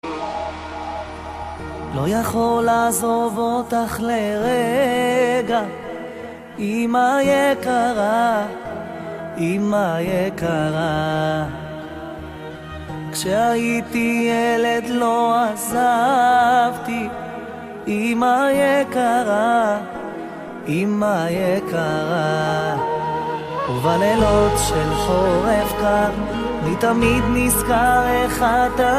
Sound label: song in hebrew